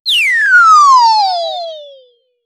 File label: slide whistle falling sound, descending tone